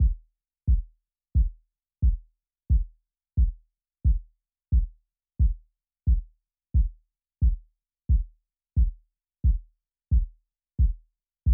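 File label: 89 BPM D Minor Kick, Latin; Melodies; Loop Stems; Blanco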